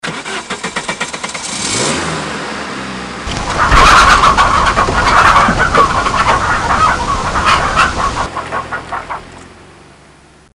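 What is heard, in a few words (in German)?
Auto fährt Hühner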